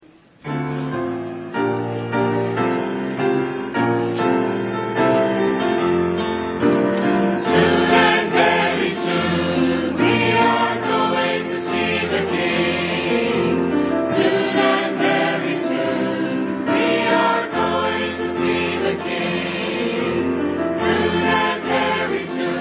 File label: choir